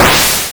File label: Die; Fault - Avoid Obstacles; Android